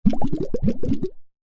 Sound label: bubbles blow